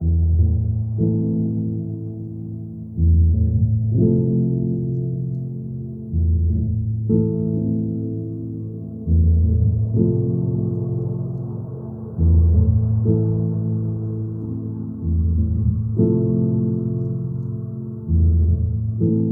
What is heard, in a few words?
Sadness Reflection